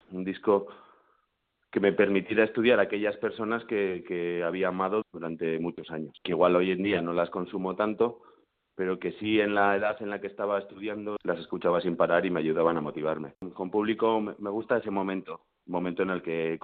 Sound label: DISCO JAZZ